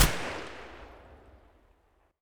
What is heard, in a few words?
ak105 close loop tail; Escape from Tarkov